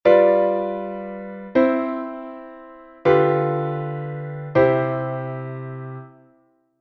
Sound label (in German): terzquartakkord; Terzquart-Accord, Terzquartsext-Accord; mendel reissmann